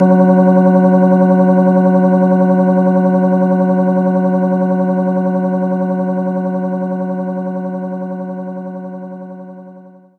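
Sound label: Organ Fast F#1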